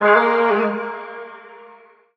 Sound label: vocal stronger, Ab; SD AURORA Sample Pack; Slate